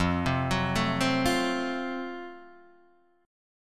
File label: Gb Minor Major 7th Flat 5th, chord